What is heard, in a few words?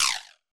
bone; HeavenStudioPlus; dogNinja